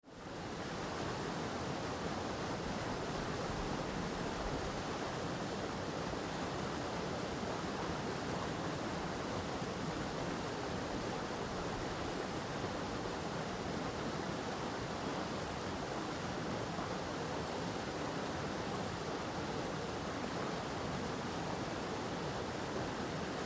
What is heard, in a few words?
Soundscape; mYcard